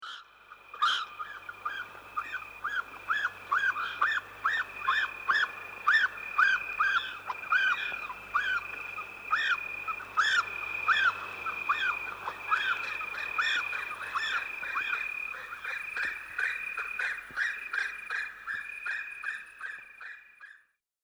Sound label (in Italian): ghiro, Mammiferi; Monte Pisano; Animali; I Tesori del Monte Pisano - I Volume Gli Animali